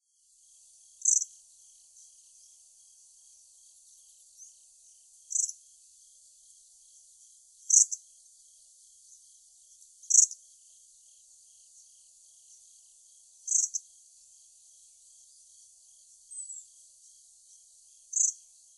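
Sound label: Grey-streaked Flycatcher; hyogo; koe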